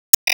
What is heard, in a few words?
Swing Miss